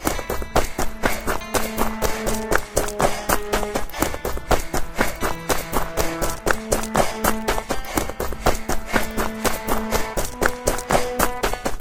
dash bgm; Yotsume God Reunion

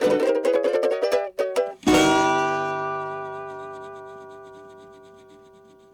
end stinger; SpongeBob Patty Pursuit

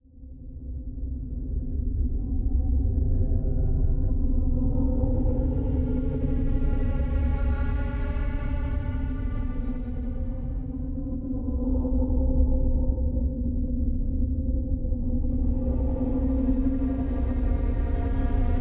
Dark Strings

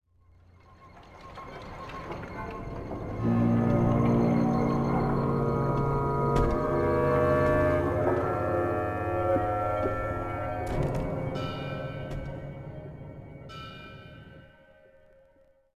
environment

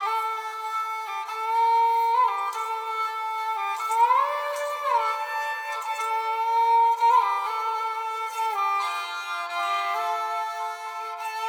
Sarangi voice; raji an ancient epic

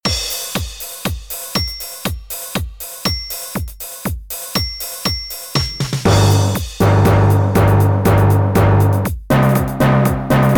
Rhythm Lesson - Timpani 4 (Pair); Rhythm n Notes

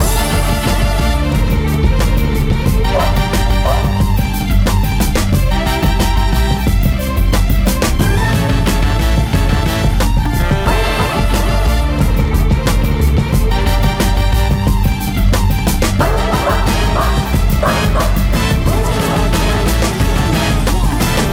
dialog

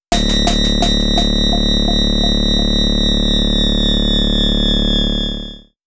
Shock; Clock Tower 20th Anniversary Sound Collection

